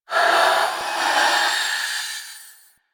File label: Minion Vocal; Warframe; Stalker Acolyte Minion